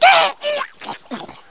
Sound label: pain; invis